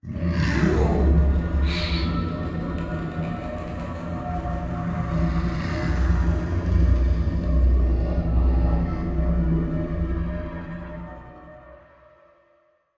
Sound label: BOSS Heaven Roar